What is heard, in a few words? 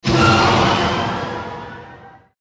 boost; lfs; naboo n1 starfighter